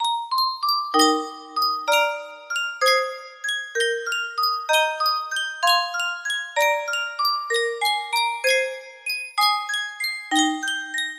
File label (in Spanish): sankyo caja de musica